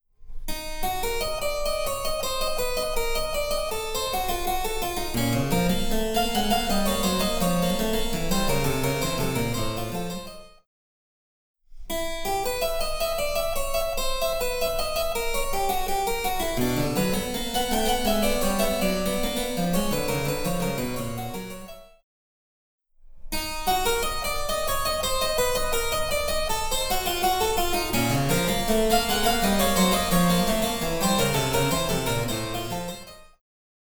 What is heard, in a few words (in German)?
Cembalo; Mark Lindley WTC 1